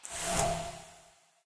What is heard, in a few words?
brush; smite; zhongkui